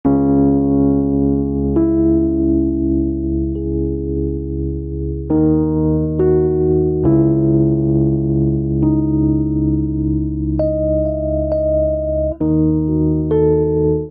Progression Melancholic Minor Db Minor - Eb Minor7b5 68 bpm, Electric Keyboards; Ableton; Factory Packs